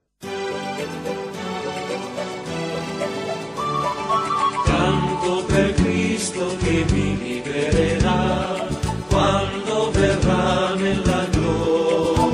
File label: canto